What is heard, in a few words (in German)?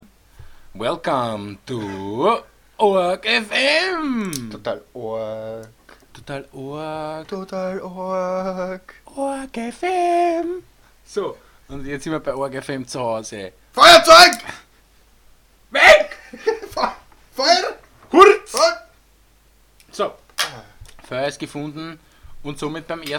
feuer